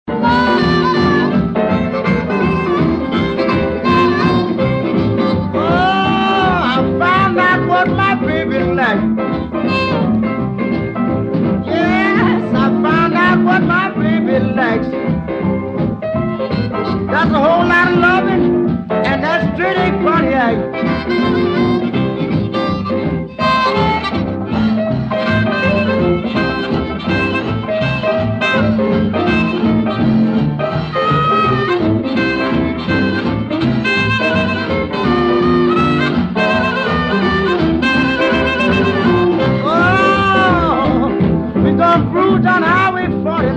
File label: Blues